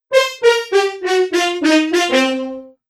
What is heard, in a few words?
Muted Horns; духовые